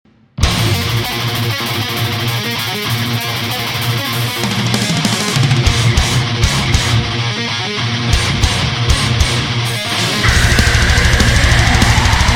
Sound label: death metal